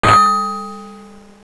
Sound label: ding